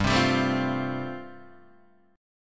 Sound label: G 9th Suspended 4th, Guitar Open D